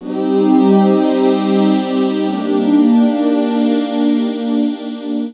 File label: SYNT, 90 BPM